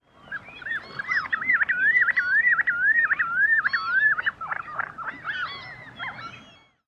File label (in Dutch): Bontbekplevier Charadrius hiaticula